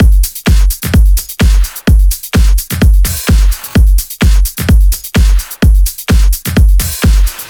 128BPM Kit; Vengeance Samples; Morpheus Kit

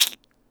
Open Hihat; Vengeance Samples; VEE Cymbals

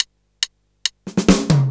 Fill 140 BPM

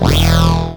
Acid Sounds E, Synths; Vengeance Samples

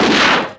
FX Impact; Vengeance Samples

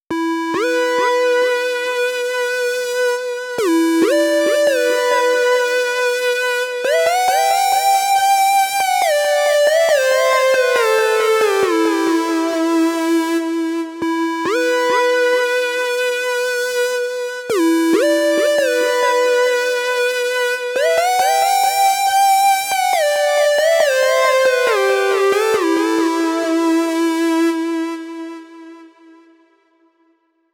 Mainlead 138BPM WET, E minor; Unlooped; Vengeance Samples; Freefall Kit

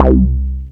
Acid Sounds C, Synths; Vengeance Samples

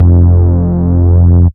Root F, Bass

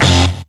Synths F; Vengeance Samples

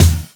Kick, Trap

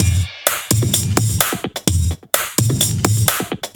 House Beat, 128 BPM; Vengeance Samples; VEH1 Loops

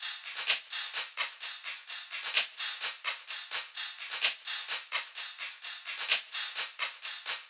128BPM Tribal Kiss Kit

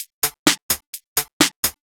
Electro Loop 128 BPM; Vengeance Samples

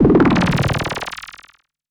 FX Scratching; Vengeance Samples

